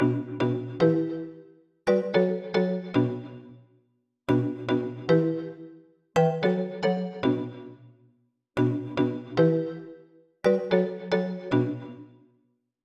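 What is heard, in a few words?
Pluck Loops B minor 112 BPM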